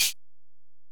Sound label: Ac Perc, Drum Machines Alesis DM-PRO